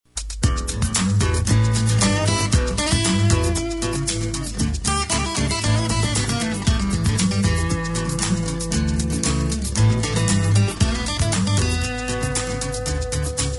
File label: country; TELEPHONE MUSIC